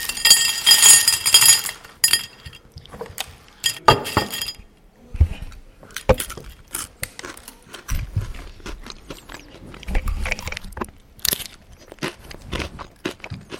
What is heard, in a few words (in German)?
Salzstangen ausfüllen und essen; Feuerkraft